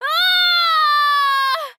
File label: k o scream; mkx; cassie cage